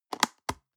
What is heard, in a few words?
plastic box food small close medium